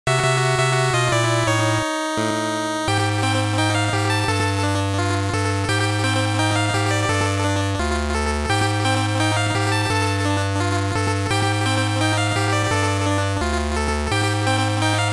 You Lose; Mario Golf; GBC; Match Game

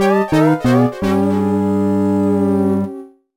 game over; Slide Adventure Mag Kid

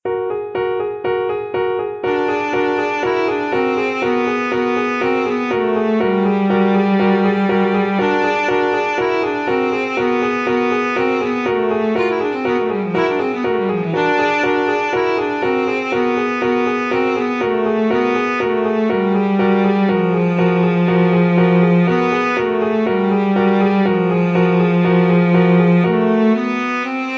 bustling town

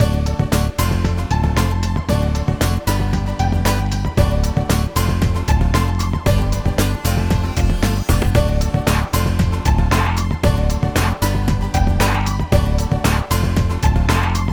Game Loop; Piczle Colors Demo

